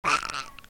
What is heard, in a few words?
goo death; The Binding of Isaac; SFX Monsters